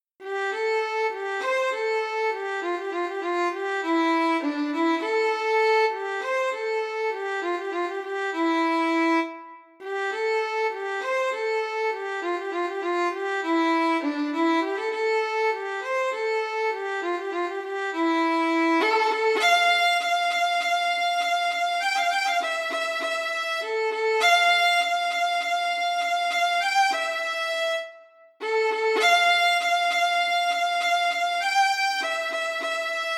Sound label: Violin Arrangement